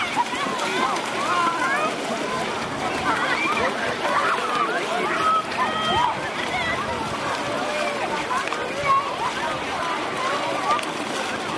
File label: sunny day on Long Lake beach Yellowknife NT Canada